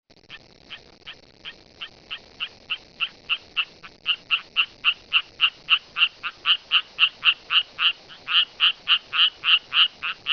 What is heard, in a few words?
rocket frog